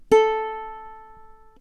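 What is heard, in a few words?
ukulele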